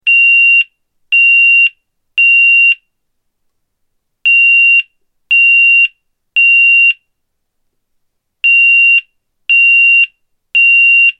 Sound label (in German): Ton